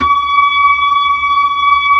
B-3, Blues

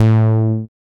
TITE BASS; Masterbits; Dance Trax

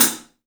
3D Drums, TIGHT ZILDJ; 3D Sound

